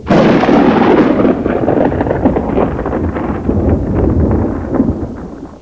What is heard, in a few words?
THUNDR; mtm2demo; PC Gamer 4.2